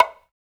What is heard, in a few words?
African Perc